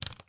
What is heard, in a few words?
zoom; outfly